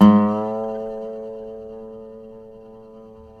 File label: SLIDE, Dobro Slides